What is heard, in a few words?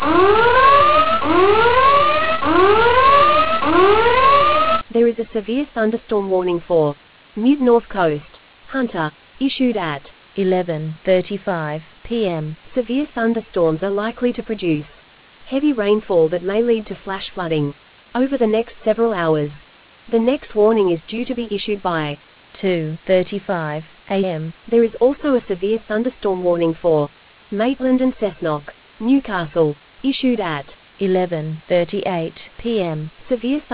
storm audio